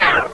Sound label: zoomout